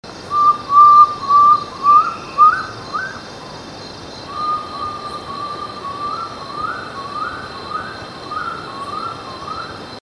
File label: phodilus badius